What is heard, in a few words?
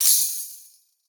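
Tambourine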